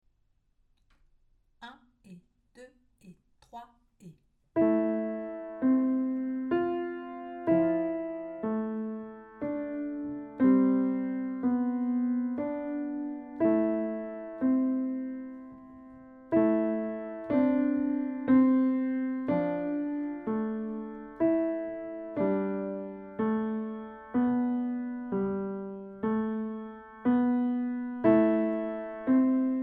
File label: Piano LENT; Valse des Cordes